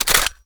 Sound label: reload empty mag out, reloads; gmod